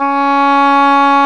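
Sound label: WND English Horn, Swell; Roland LCDP04 Orchestral Winds; 90 s Sample CDs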